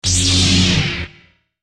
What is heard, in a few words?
saber on, weapons